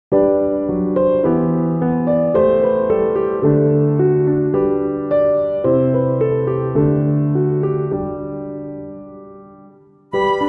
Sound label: INSTR